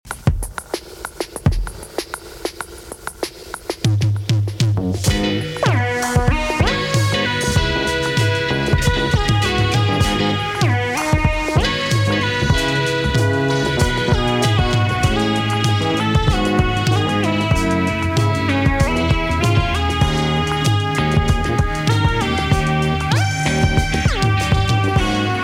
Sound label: Funky Sci Fi